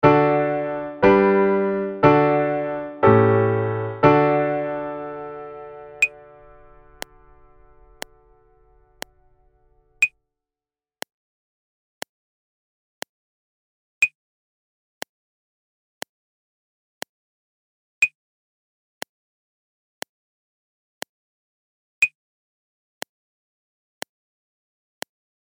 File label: D Major 44 4 Measures wo Piano